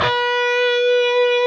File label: PRS C, Guitars, LEAD